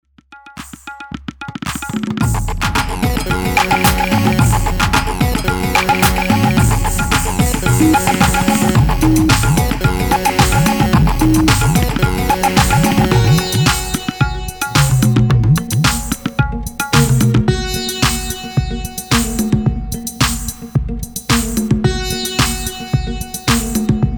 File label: sitar trance